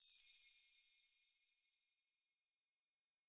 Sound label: fairy dust; soundscrate